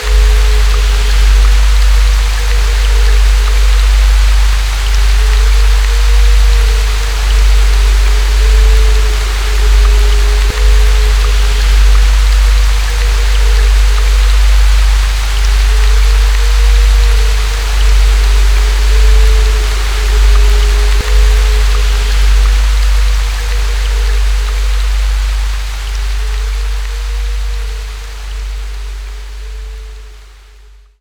Haunted Rain; luigis mansion dark moon; 3ds switch

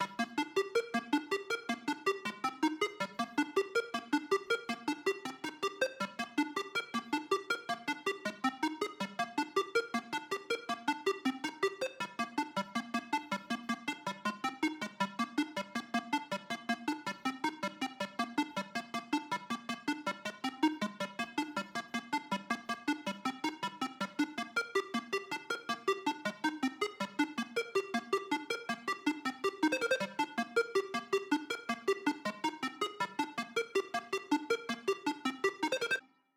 Melody; chippy

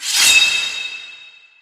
Charging bar flash; A Bloody Night

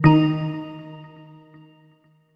Jingles PING; Semblance

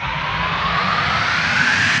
Explodeswell; blastmorph pinball; xbox series xs

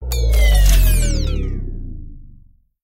ending lose; iDaggers; Android

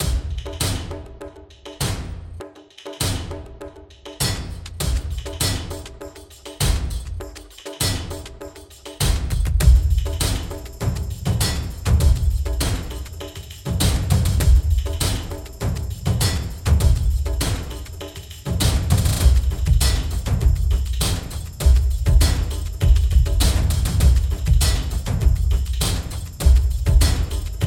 Percussion Loop Fast Version; Dual Blade Battle of the Female Ninja

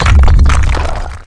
rock fall short; Birthday Party Bash; Wii

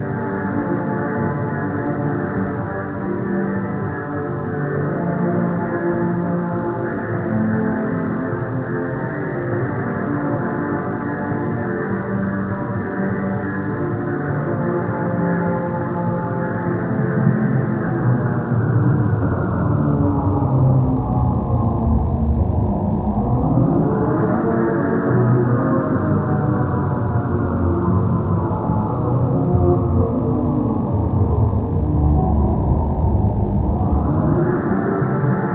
Dance (Slow); Hollow Dance; five nights at freddys into the pit; ps4 ps5 switch windows xbox one xbox series xs

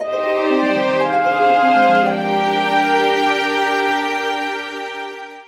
battle lose; Gisou Shoujo to Kyokou no Machi; Android

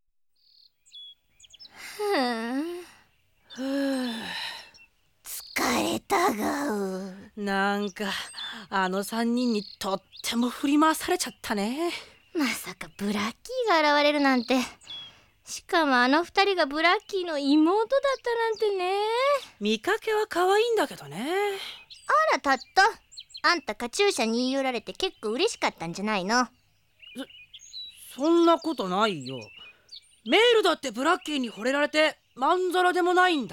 Bonus; Falcom Music Sampler 96

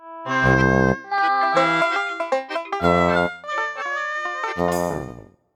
Beats; Sparklite

